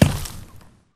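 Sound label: Bullet Light Ballistic Dirt impact; Titanfall 2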